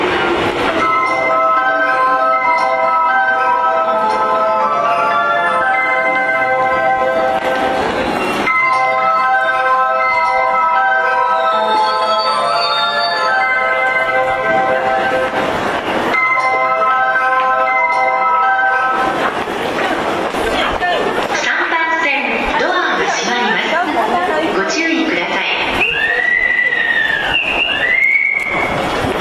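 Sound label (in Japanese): 池袋駅, メロディ; 音質が非常に良いです。; 埼京線; 赤羽・大宮方面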